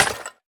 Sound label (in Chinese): 饰纹陶罐碎裂声